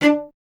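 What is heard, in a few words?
strings cello D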